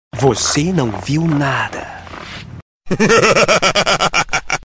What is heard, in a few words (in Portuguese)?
risada maligna; voce nao viu nada